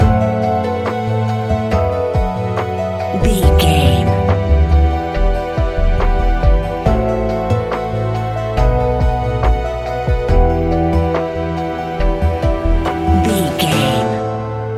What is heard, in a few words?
spectral lullaby, A relaxed and chilling piece of music